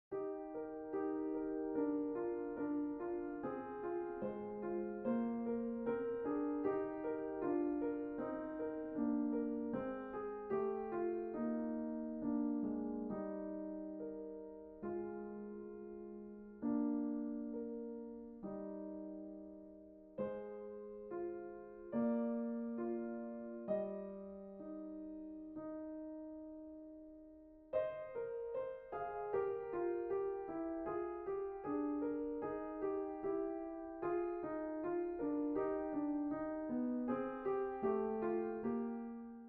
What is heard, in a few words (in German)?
Schattenspiel